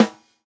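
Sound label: snare, Roland MV8800 drum kit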